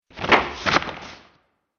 Pirate Treasure Map, Cartoon Sound Design Sound Effects